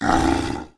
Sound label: Flaming Dragon Hit; Blind Quest - The Frost Demon